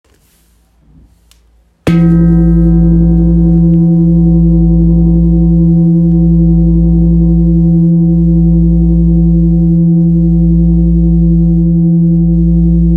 Gros Bol tibetain Mi 9.8kg

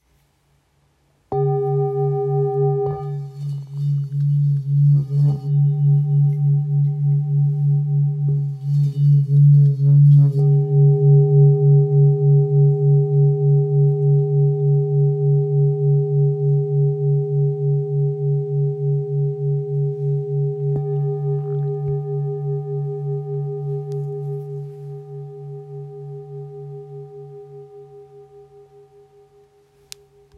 Sol dièse 1,470kg

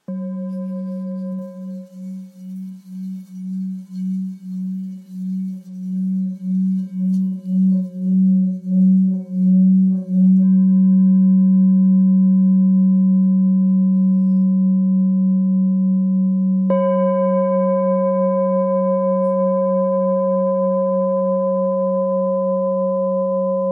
Bol thick fa diese

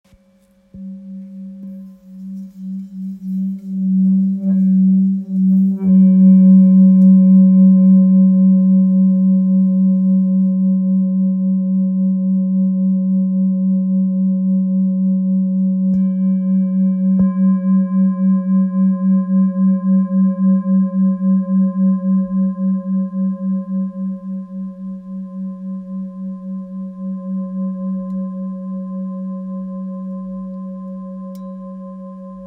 Bol bengale sol diese

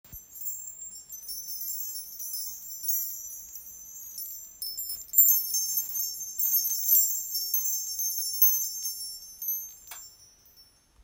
Chaîne à vent

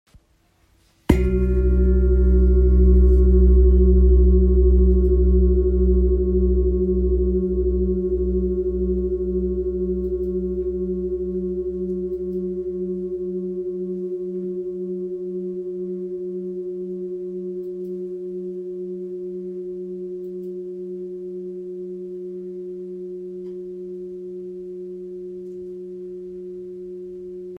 Bol grave pieds organes si

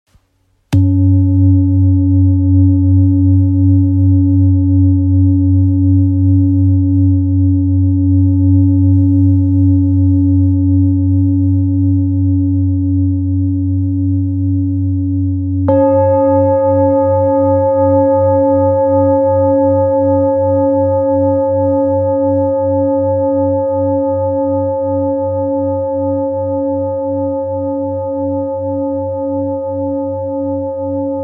Bol therapeute fa diese 2,17kg

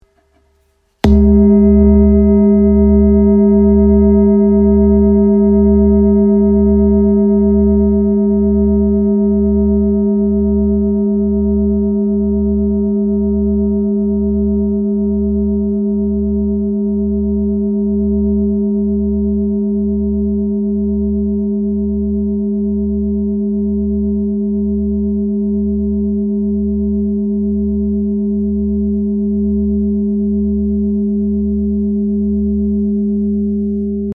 Bol chantant therapeute 2.050kg re

